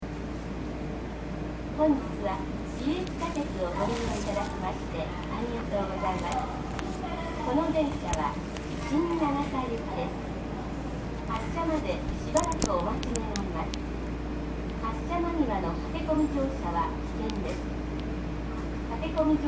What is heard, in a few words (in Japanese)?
5000系, 走行音; 神戸市営地下鉄; 海岸線三宮・花時計前〜旧居留地大丸前